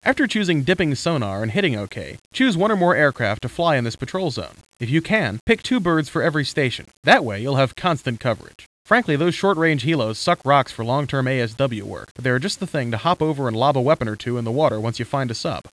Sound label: HARP